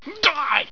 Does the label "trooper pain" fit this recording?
Yes